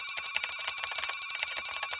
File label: count Up Loop; netent; whenpigsfly